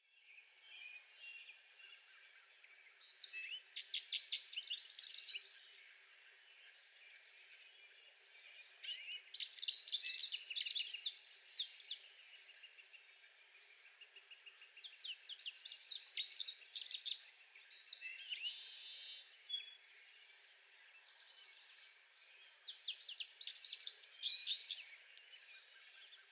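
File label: American Goldfinch Songs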